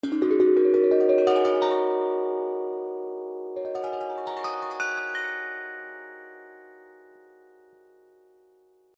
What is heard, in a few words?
ubuntu touch tongue drum